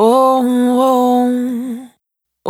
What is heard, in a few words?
Urban Dry, Single Voice Melodic Loops; Pocket Diva World Class Vocal Samples